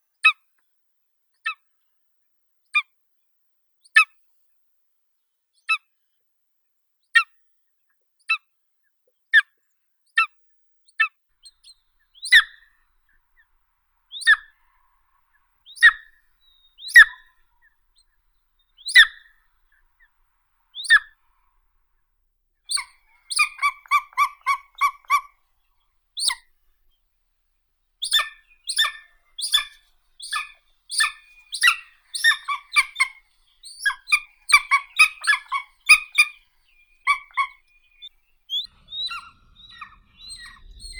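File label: golden eagle, Aquila chrysaetos; berkut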